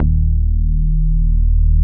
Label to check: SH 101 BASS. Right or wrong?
Right